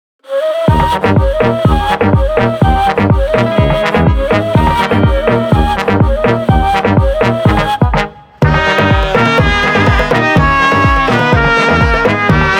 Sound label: Balkan Brass